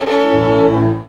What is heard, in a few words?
VIOLIN HITS; Best Service; World Colours